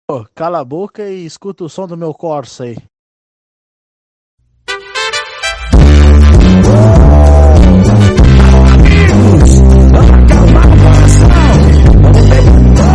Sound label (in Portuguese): cala boca e escuta o som do meu corsa